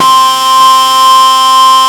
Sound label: WAILING, DIRE LIKE; East West - Global Sounds